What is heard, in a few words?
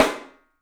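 PICOLO SNRS, snare, drums; 3D Sound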